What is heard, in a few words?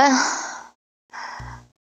Moan